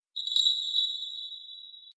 jingle; hs dxd

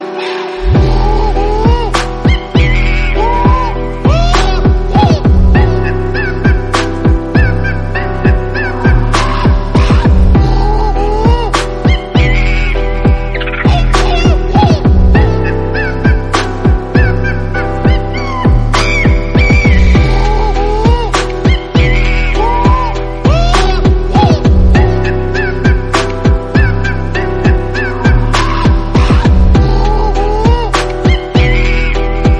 monkey type beat monkey sound beat music

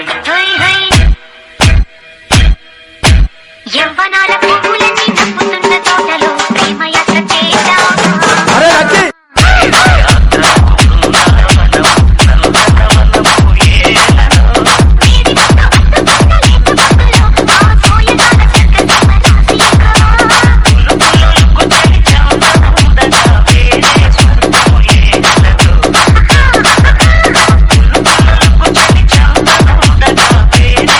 dj remix, bhakti devotional

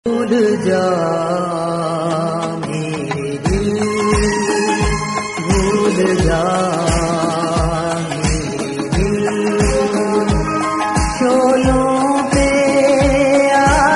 sad emotional hindi

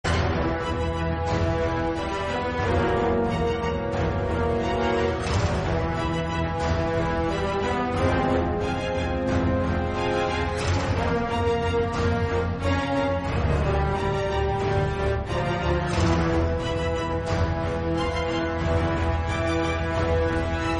violin bgm

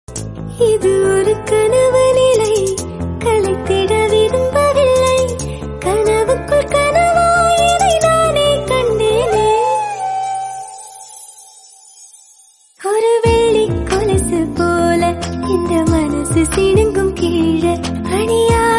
tamil song

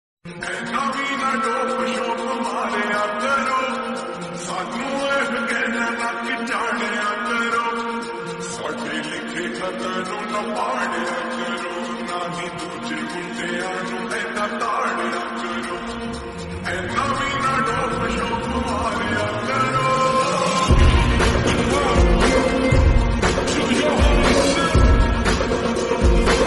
slow reverb

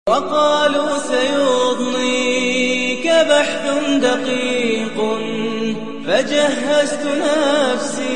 islamic sms

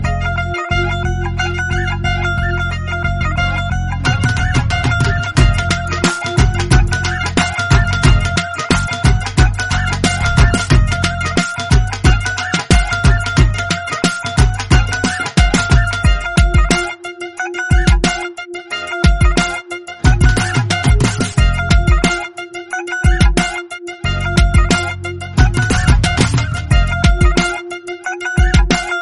punjabi dhol

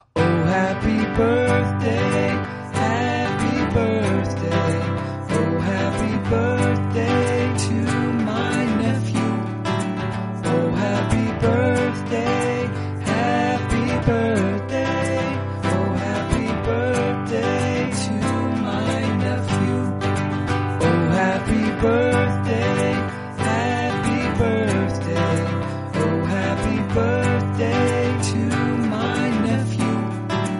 happy birthday english

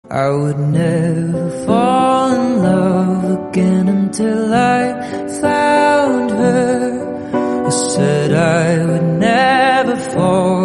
piano version

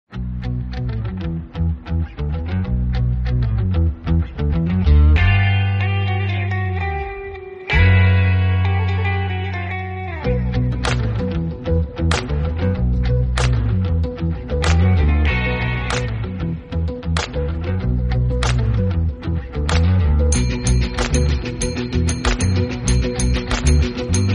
instrumental bgm theme; erkenci kus

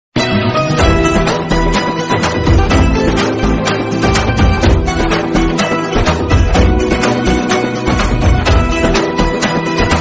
bgm theme

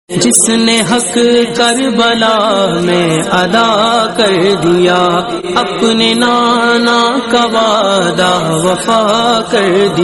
islamic hindi qawwali

